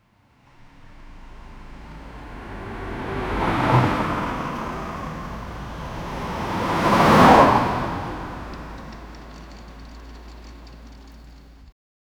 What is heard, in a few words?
car coming and crashed, accident